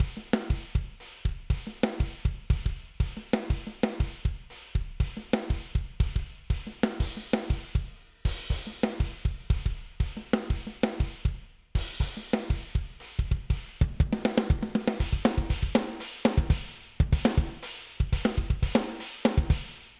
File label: drums without reamp; drum re-amping